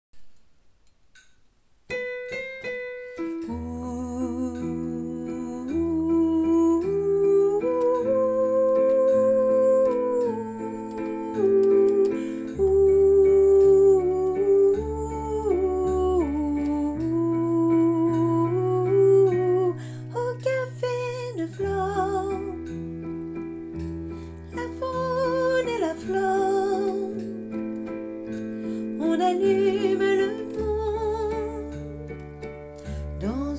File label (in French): valses de vienne soprane